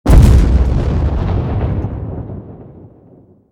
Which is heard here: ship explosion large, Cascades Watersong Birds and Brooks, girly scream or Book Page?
ship explosion large